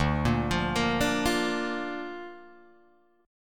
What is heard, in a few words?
D Minor Sharp 5th D down Guitar Drop D